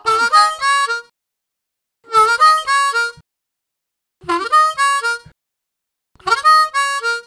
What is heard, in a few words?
Stops, Harmonica Riffs; Zero G